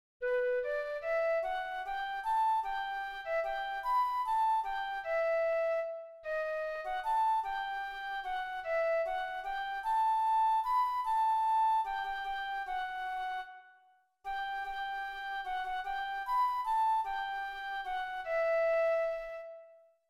FLUTE; Il est bon de louer

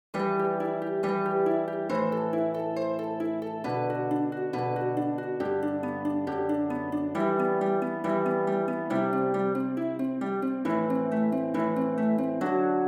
HARPE

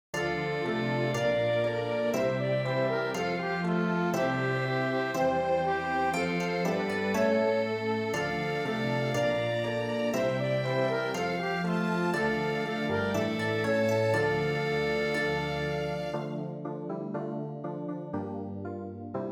SANCTUS ORCHESTRE; St Jean

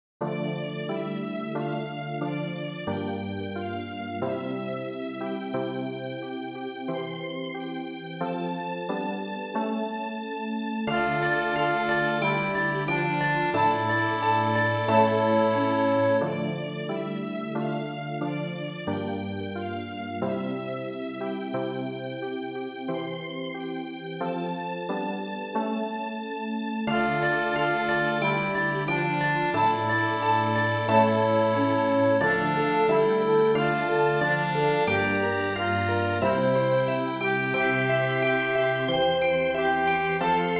Orchestre; AGNUS St Jean

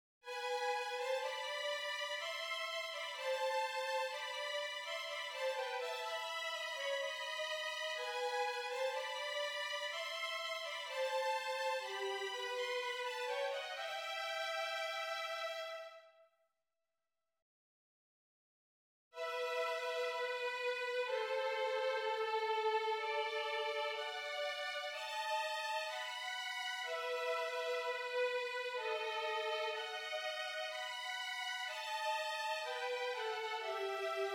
violons

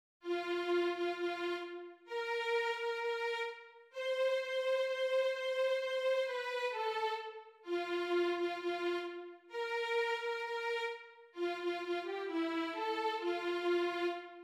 VIOLON

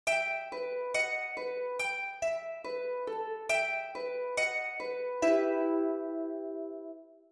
HARPE

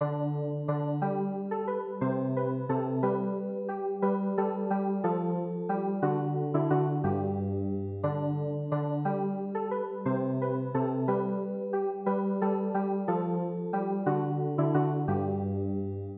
CLAVIER

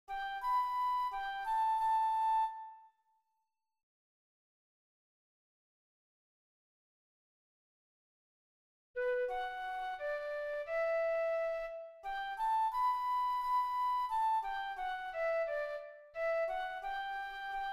FLUTE

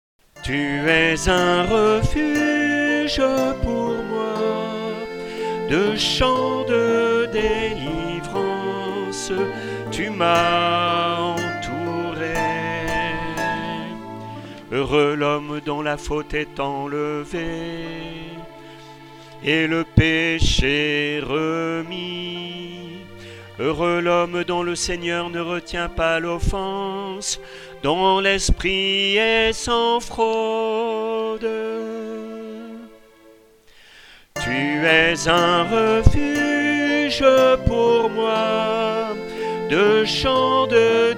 Chant Musique; chanté; PSAUME 31

